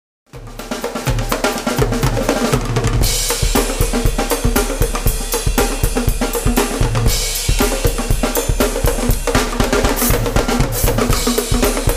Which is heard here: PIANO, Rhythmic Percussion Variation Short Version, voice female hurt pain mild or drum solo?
drum solo